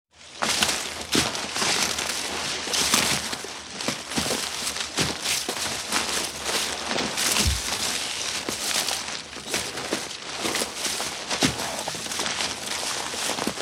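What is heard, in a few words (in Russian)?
Пробираются сквозь заросли кустов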